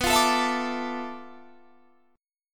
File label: D Minor 6th Add 9th B